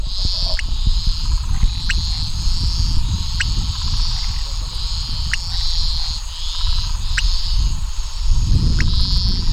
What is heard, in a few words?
Leptodactylus podicipinus